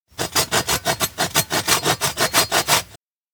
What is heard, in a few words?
saw wood cut; anemoi